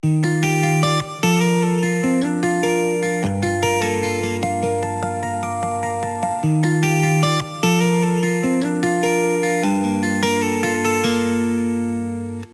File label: bit crusher light; warmth dev